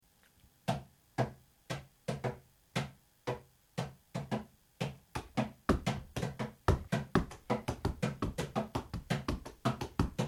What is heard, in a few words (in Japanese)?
合奏（ングワイの合奏）; africa